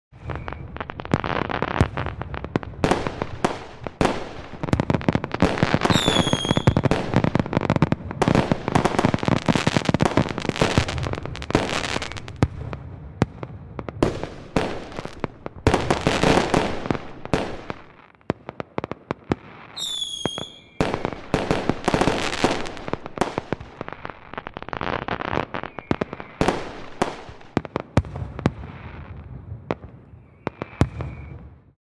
Fireworks display various fireworks and rockets grand finale